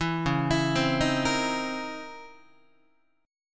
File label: C sharp Minor Major 13th C sharp Guitar; C#mM13 arpeggiated; JGuitar